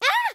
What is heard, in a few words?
build Idle; Talking Tom Gold Run